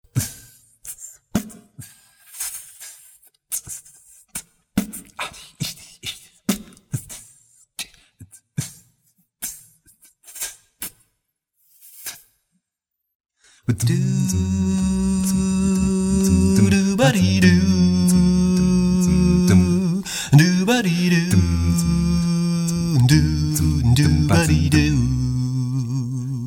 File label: Vocal Jazz